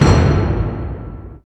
ORC HIT

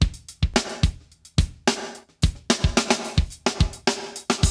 break; ZERO-G; Datafile

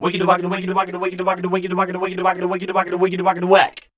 SCAT, Voice Spectral

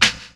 SNARE; E-Lab; X Static Goldmine 2

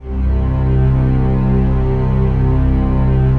PAD, Pads; Best Service; XX Large

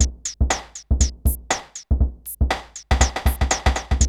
BREAK; ZERO-G; Datafile

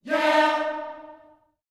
"yeah", gospel vocal, D#4; Spectrasonics; Vocal Planet Vol.1 Gospel; akai

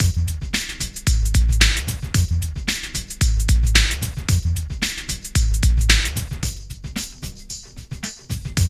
PHAT LOOP; E-Lab; X Static Goldmine 2; akai

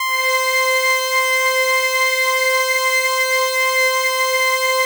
Atmo Pads; Best Service; XX Large

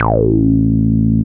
bass; E-Lab; X Static Goldmine 1

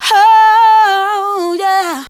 VOX; ZERO-G; Datafile

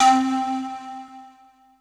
FLUTE; ZERO-G; Datafile

